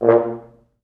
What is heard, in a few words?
4 FrHorns